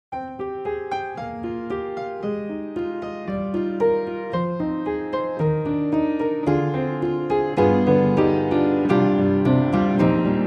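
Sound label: Upright, piano; Spectrasonics; Keyscape; Wing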